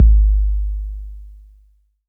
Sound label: BD 808 Tape Decay